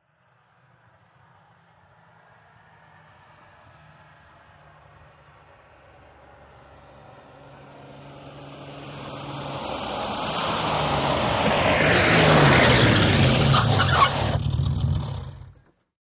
VEHICLES ASTON MARTIN: EXT: Approach, fast with squeal, switch off.